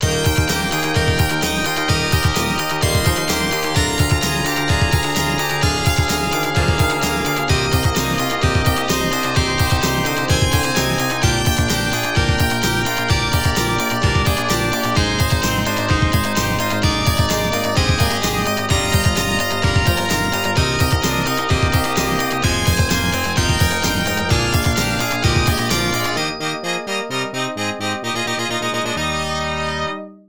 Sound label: Loading BGM; Star Luster; x68000